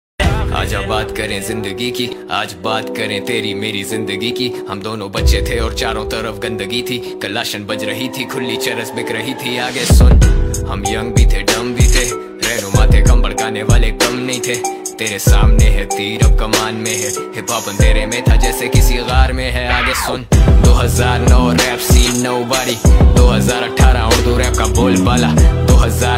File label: rap song Ringtone